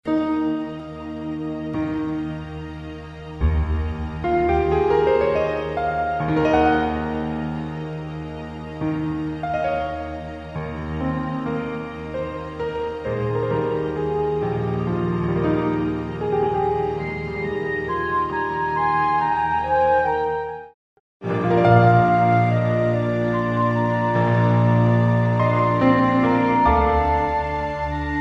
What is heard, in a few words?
piano; orchestral music